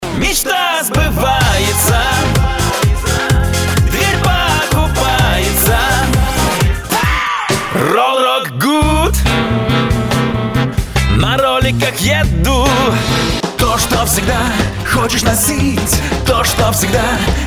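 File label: voc retro, Russian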